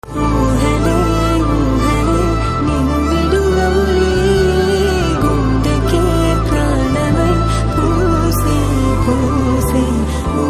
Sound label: Song Ringtone